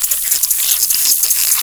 Spider Walk

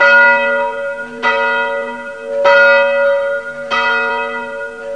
Bell

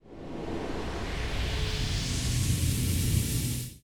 ghost absorb

